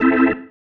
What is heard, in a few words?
Stab, Organ, One Shots